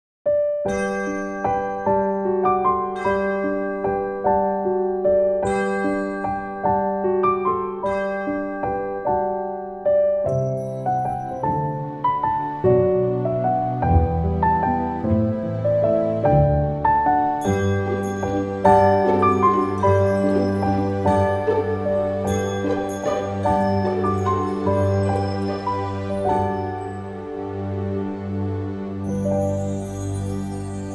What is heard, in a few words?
Christmas Style